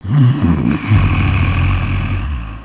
Pet Doberman Snore

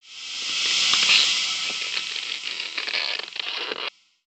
Ice Crackle

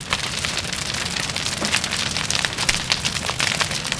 Jungle Campfire; gnarled forest

